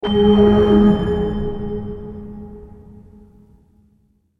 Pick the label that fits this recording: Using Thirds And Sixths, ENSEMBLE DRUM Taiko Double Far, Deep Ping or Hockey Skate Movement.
Deep Ping